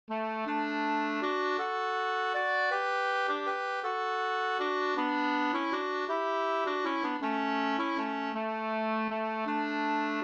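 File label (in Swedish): vals brudvals, two-part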